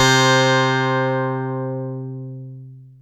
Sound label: DW8 CLV C3, Korg DW8000; Back In Time Records; Korg Universe VOL-1